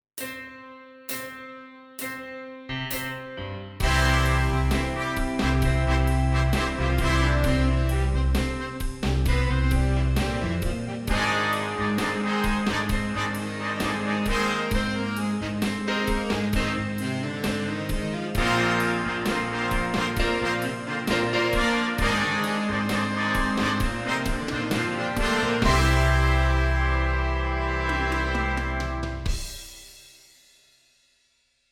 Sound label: Horns in Fifths